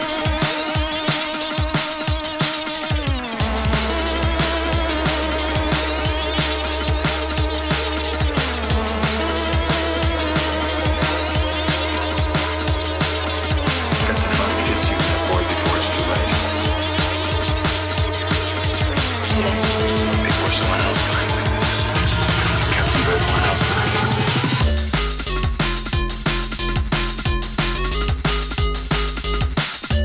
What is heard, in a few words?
roll chick beat